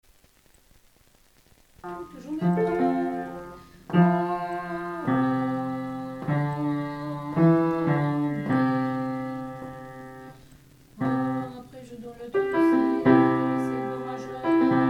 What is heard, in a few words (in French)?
Amen basse